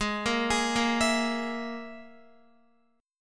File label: G sharp Suspended 2nd Sharp 5th, Guitar; G#sus2#5; arpeggiated; JGuitar